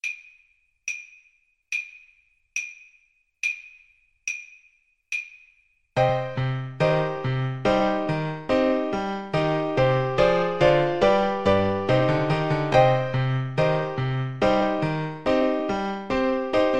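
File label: bach badinerie alto saxophone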